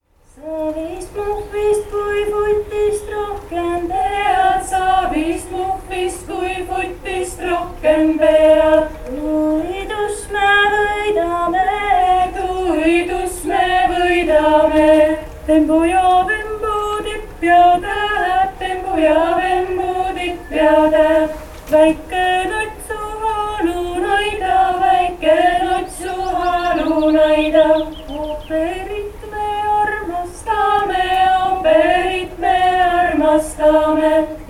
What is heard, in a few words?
folk